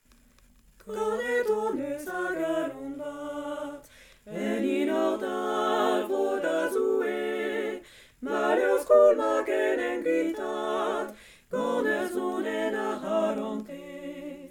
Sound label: tutti, chorale